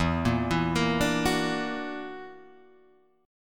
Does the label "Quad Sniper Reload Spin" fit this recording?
No